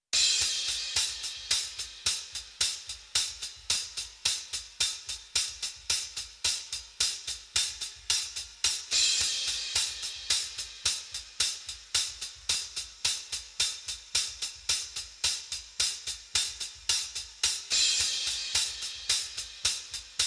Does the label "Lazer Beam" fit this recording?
No